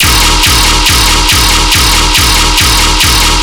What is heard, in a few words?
Bass Loop D# 140BPM, Dubstep; Grim Reaper's Anthem